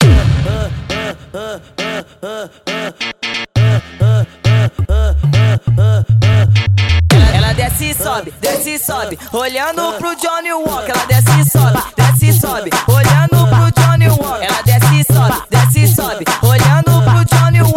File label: funk bass